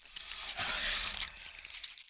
ambient

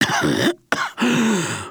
cough short

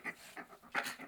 RAT AMBIENT

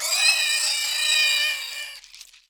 DIE

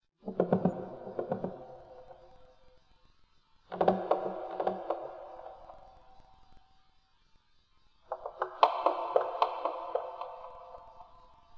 Ghost Knocking

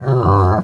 ambient

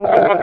ALPHA ORC DOG Hurt

